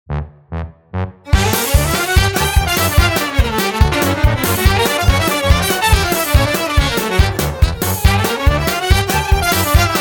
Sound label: balkan